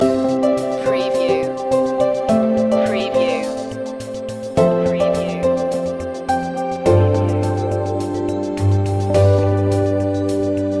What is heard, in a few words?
corporate heart loop